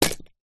wood pieces handful small light thin set down; foley; zapsplat

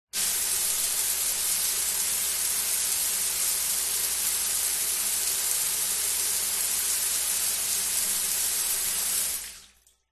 household shower run off

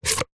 sport cone plastic stacked pull release

